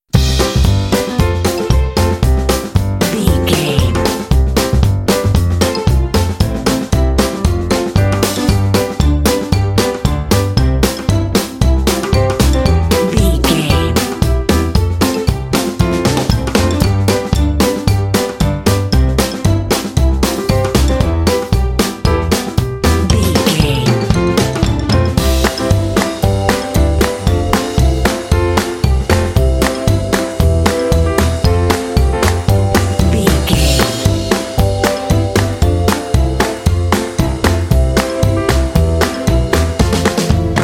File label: polka comedy party alt lighter mix